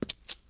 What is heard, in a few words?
FS Stone; kult